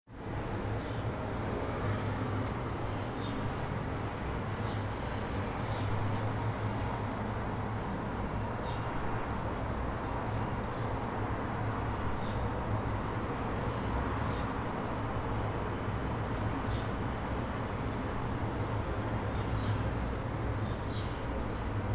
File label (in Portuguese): Seminario Varanda